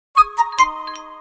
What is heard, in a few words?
Vivo X100 SMS Ringtone